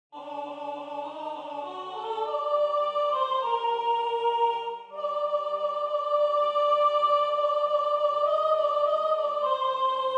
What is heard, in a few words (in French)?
tenor